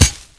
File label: bush; Mafie 1